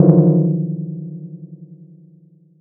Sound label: Chord; ModelCycles